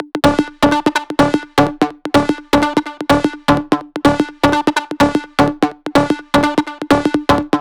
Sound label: Melody 2, 126BPM; Vengeance Samples; Thommy Kit